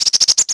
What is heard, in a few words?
birds; World of Tanks Blitz; hangar 9 may